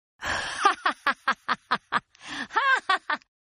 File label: Laugh Female; Laughter; Voice; Partners In Rhyme